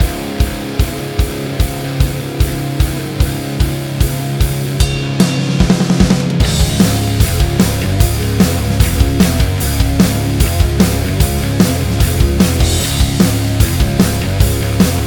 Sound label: BGM PVP